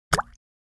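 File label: Drip Single Falls Liquid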